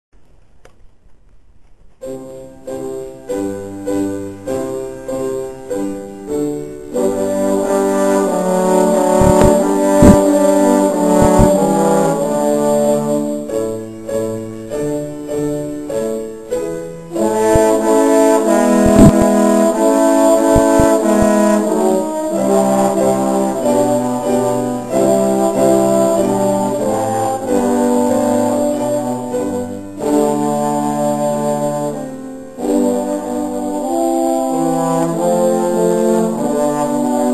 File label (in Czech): tromb trio; Vejvanovský (část)